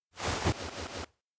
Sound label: joke bump; awayuki; anemoi パッケージ版 1.1